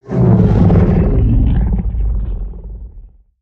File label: Purr; creatures pets